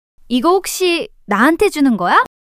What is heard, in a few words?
surprise